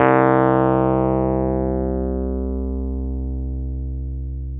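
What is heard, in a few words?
piano, Wurly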